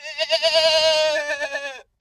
goat scream

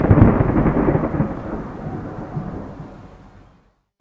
rain thunder

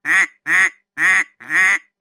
duck quack

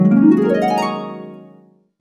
harp glissando

